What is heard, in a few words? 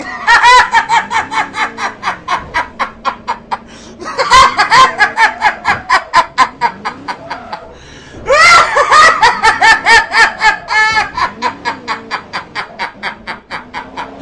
Laughing